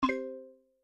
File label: notification